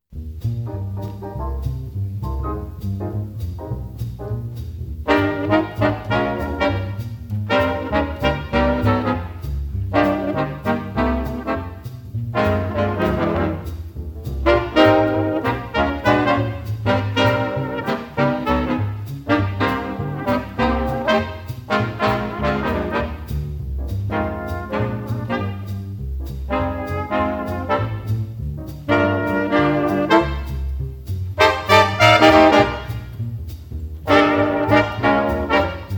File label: drums no drums audition track